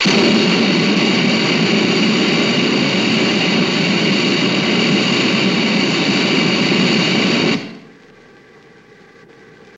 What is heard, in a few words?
Flame Thrower Blast